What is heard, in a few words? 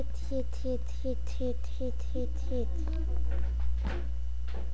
hit